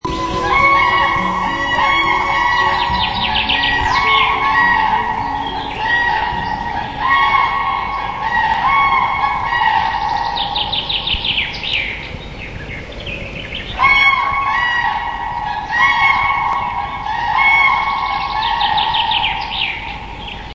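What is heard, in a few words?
common crane voice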